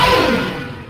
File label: blaster; jango fett